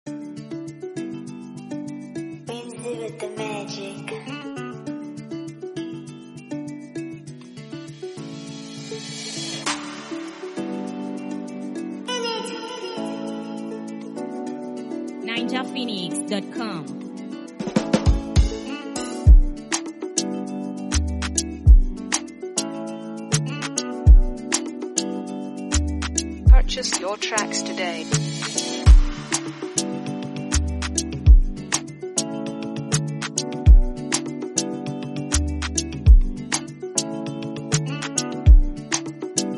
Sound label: Afrobeat Instrumental